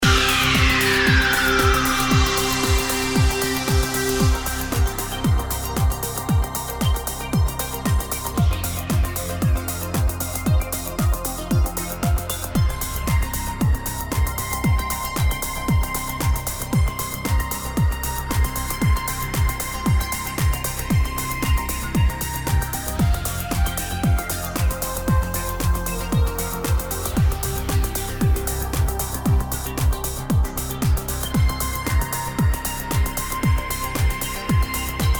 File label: Electric Rain Looping